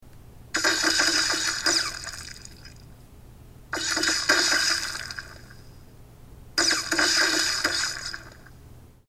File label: other game mode, monitor; 一般螢幕喇叭; 遊戲模式; 增強聲音定位，以便您可以識別細緻音效的位置或來源，例如飛行的子彈或敵人的腳步聲